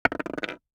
DICE on Hard Wood, Throw and Roll, Metal, 1 One Die; Samples; ArticulatedSounds; DICE Sfx Party (Extended)